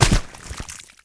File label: Water splash